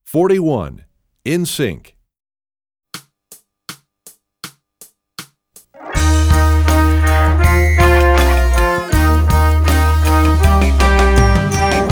trombone; essential elements

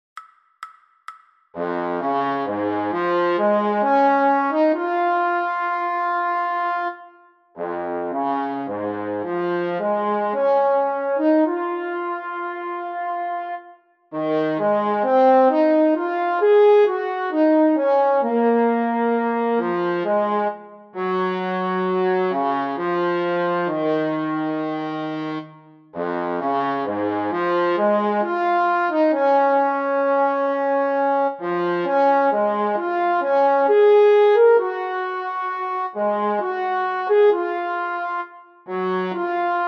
fast, trombone

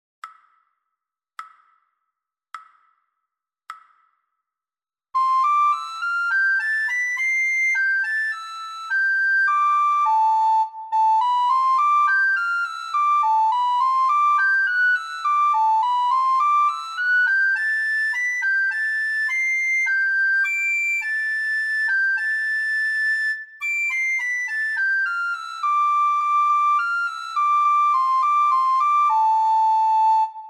Two Studies - slow, recorder; Linde

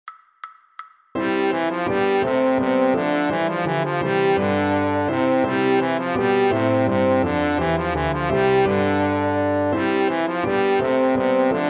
Minuet trombone; Corelli

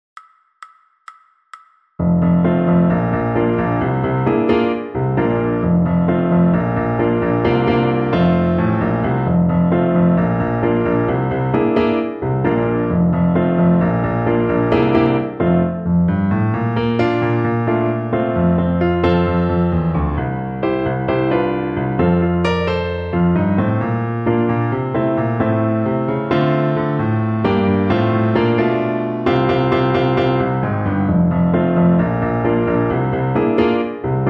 piano

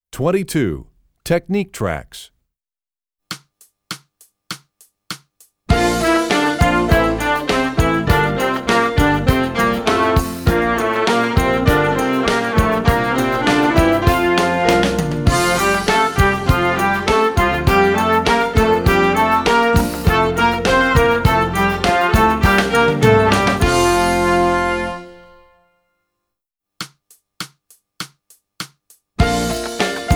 Technique Trax, trombone; essential elements

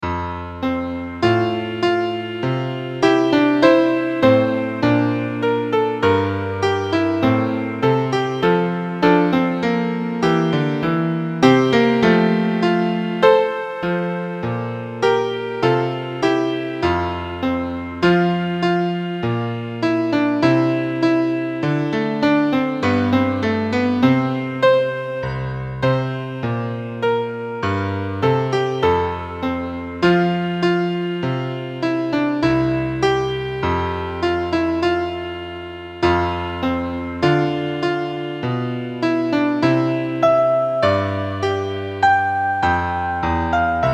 piano, trombone; Komm, o komm

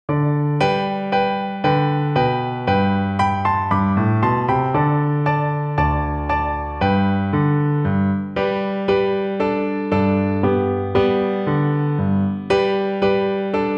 piano; Mozart

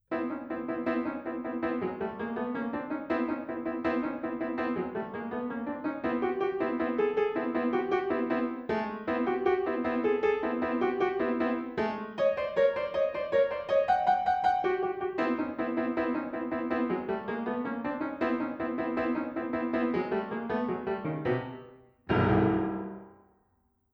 hysterical fairy; piano

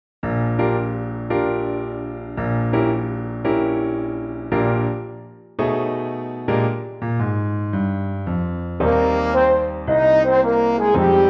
trombone fast

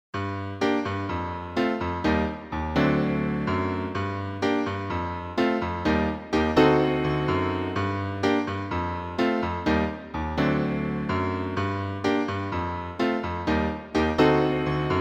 piano

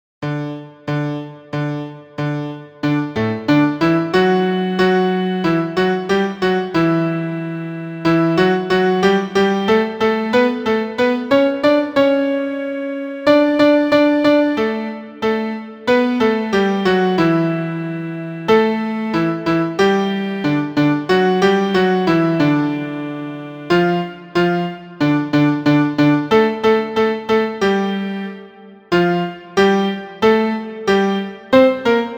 Articulation Study trom

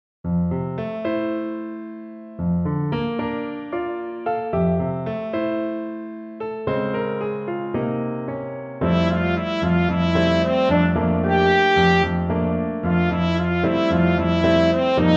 Latin trumpet

